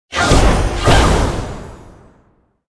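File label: bee attack, monster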